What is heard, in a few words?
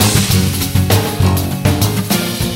loop